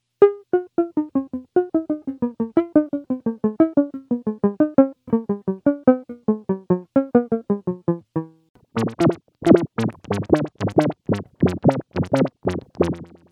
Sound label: Fade Out VCA; guitar synth